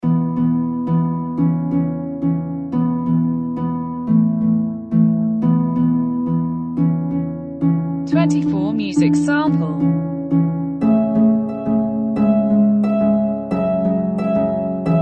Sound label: harp sample